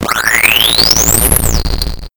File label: Echo Sonar; ld31 space diggers